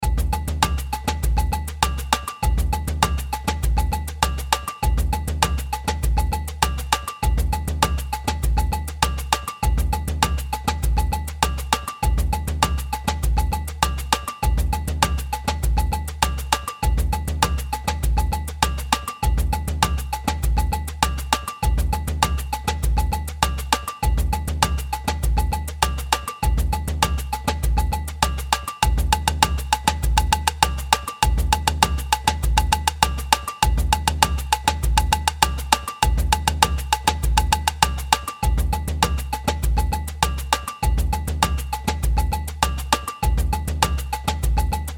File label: hip hop